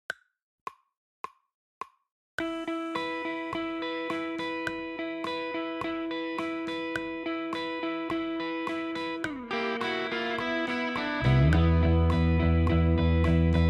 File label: drumless